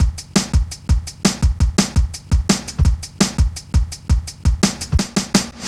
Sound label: Step Ez, Drum Loops, Jungle, 168.5